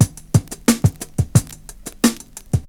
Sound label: FUNK 89, loop; 0- 99 BPM